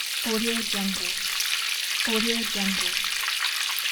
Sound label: bath running full bath water flow